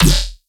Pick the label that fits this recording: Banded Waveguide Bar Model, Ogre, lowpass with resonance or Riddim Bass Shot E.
Riddim Bass Shot E